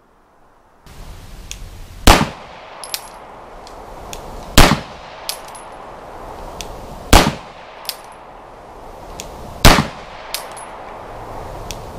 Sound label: gun shot